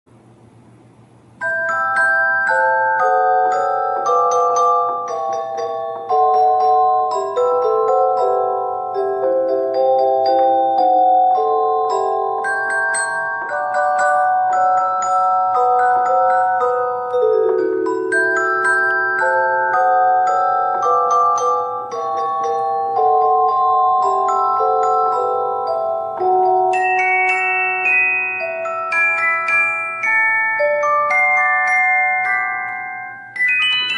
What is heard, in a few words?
Celesta